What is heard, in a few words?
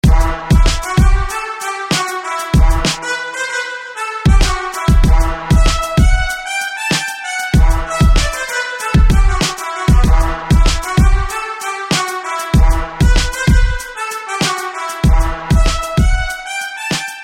Tall Hall Brass; SFB FX